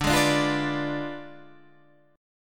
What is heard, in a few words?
D Diminished 7th D down; strummed